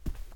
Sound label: carpet step; Golden Crayon Sounds